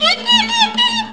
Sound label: VIO MISC, MISC VIOLINS; Best Service; World Colours